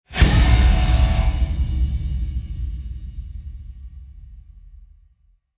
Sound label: Stadium Rock Hit; Gothic Storm Music